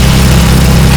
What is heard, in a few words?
fire loop